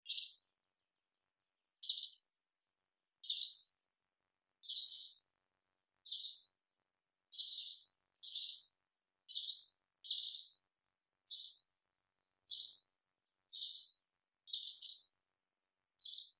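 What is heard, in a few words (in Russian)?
swallow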